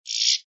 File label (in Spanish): garras; campero